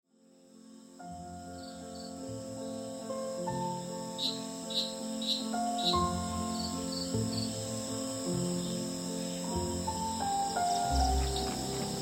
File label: Piano Concert C Major; 2 Movement